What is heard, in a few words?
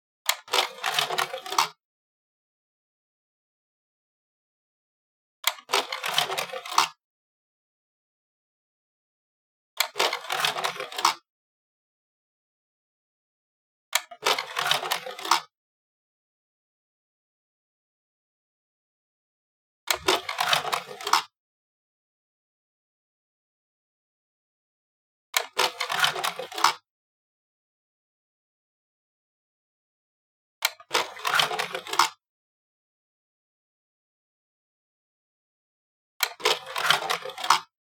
Slide Projector Reflecta Without Fan Noise